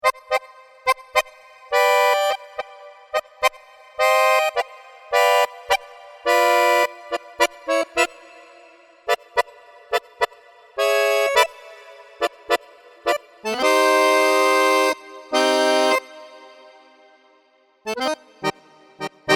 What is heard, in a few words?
Tango Solo, tango accordion